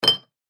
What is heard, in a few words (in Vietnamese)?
tiếng va chạm chai thủy tinh